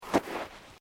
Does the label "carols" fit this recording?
No